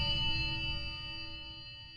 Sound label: sonar Tail Water Medium Beacon; Barotrauma; Real Sonar